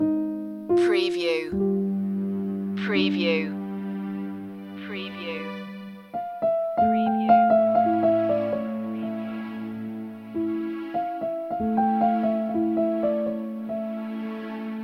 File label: gentle movement loop